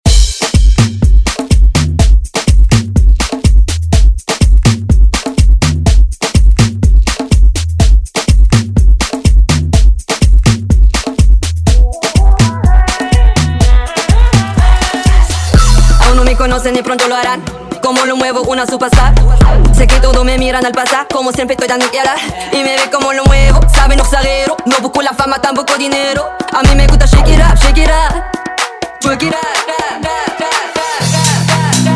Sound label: Afro House Redrum